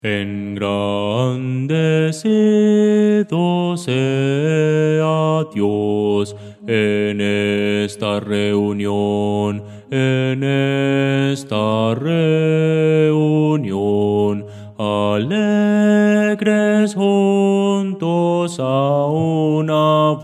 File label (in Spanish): bajo; Voces para coro